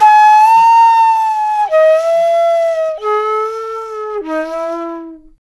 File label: Natural Flute FX, Jazz Solos and Sections, Traditional; Flute Loops; Sonic Foundry (Sony Creative Software)